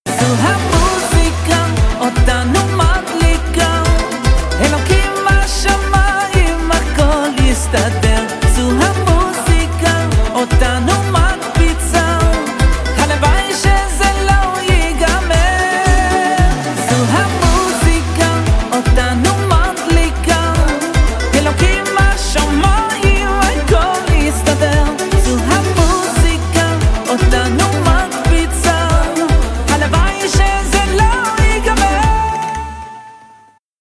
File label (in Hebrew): מוזיקה צלצול